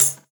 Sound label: Closed Hat, JUNGLE BREAKS